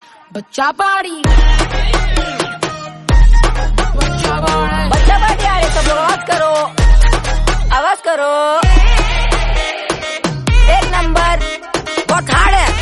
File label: BGM